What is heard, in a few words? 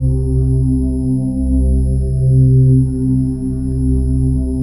PHASE PAD, ATMO PADS; Best Service; XX Large